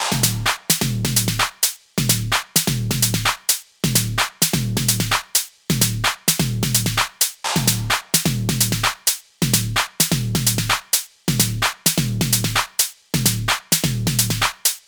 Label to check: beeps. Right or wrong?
Wrong